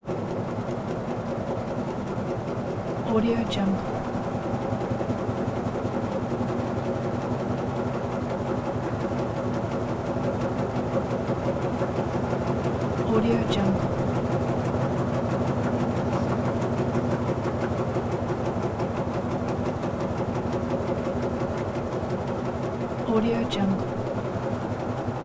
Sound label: bus constant; prosoundfx